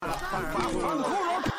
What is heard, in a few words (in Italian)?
chorus